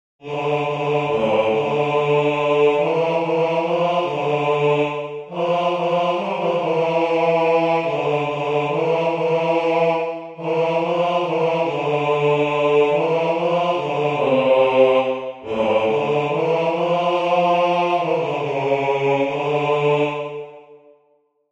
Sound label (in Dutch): MEIPLANTING - tenor